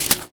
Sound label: Foley